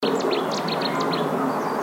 bouscarle de cetti

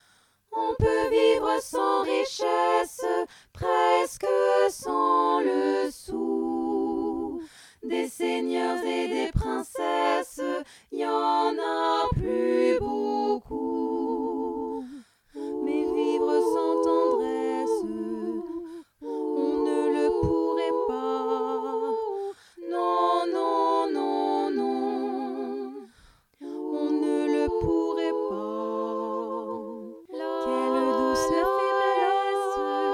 tutti

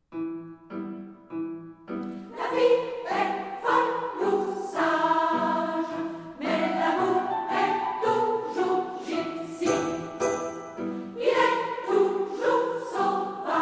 choeur des bohémiennes

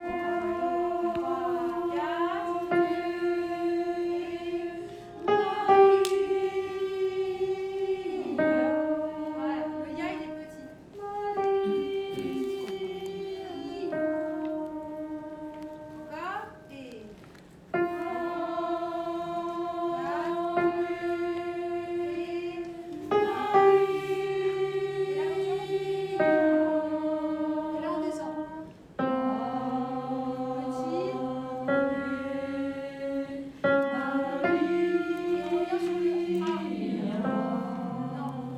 alto mesure 48

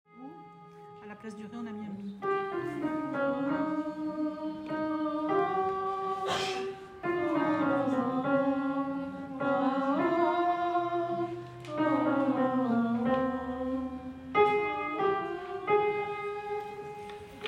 alto

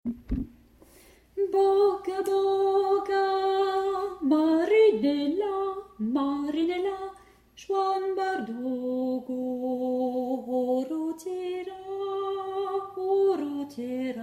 basse

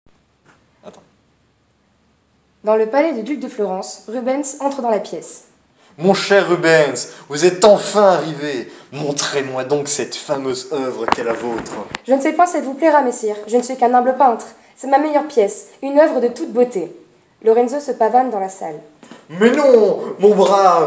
vocal

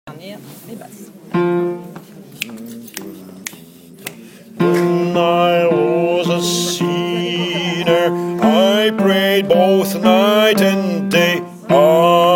basse

